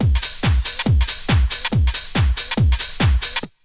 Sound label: Essential beats